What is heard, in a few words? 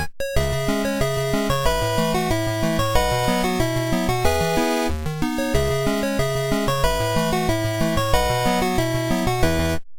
8bit; fire force op